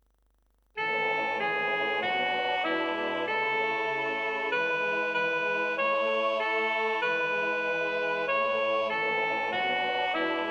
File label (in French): la belle rose soprano; Chants